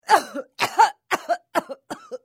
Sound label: cough